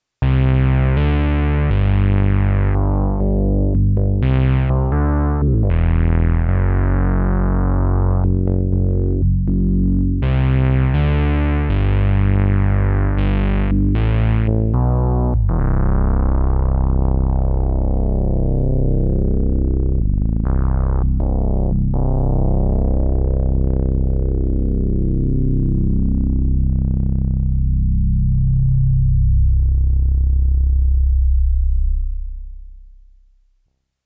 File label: Key Piece Obsidian Synth Bass